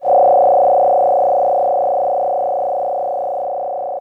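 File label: DX100 F#4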